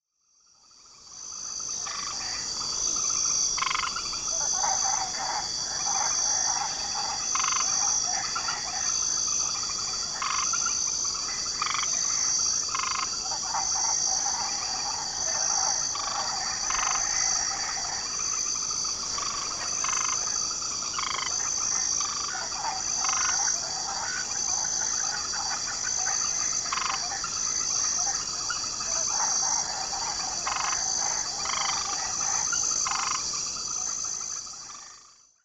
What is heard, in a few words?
frogs and insects jungle